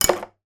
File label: household nail brush small plastic drop into basket with other bits in; zapsplat